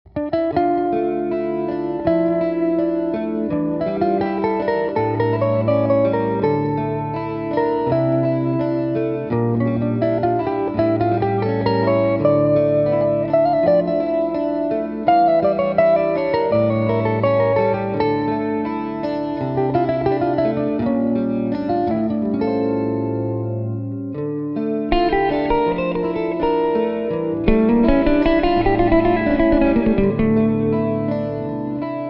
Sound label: harmonic minor